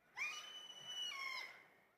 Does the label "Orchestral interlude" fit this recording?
No